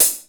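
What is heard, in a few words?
hi-hat, drums; East West; Global Sounds; RY30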